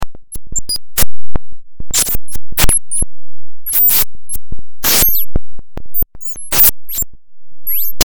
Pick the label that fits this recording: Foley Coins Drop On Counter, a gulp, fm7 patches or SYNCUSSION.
fm7 patches